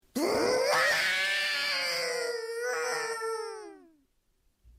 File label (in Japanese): 落雷